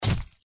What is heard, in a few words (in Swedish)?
giljoklinga Hugg